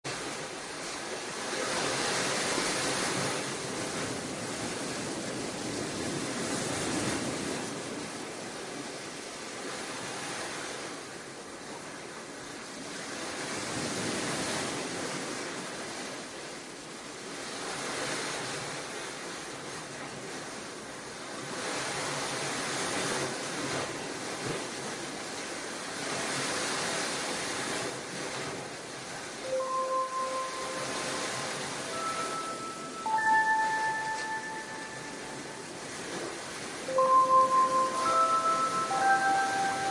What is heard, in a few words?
Seagull, Relaxing Sounds Of The Oceans